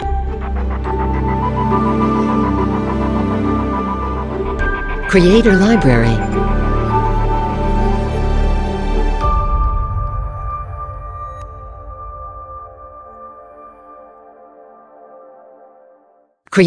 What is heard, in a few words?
Bright Orchestral Logo; Logo-Idents